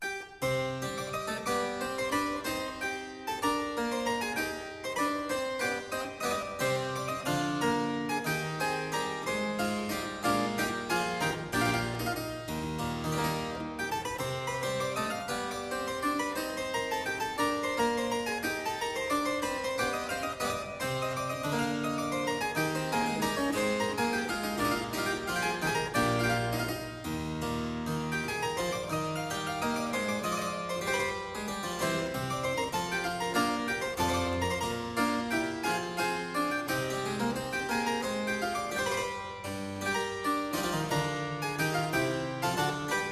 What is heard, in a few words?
Suite in d minor - Courante - Double de la Courante, Harpsichord; Jacques Champion de Chambonnieres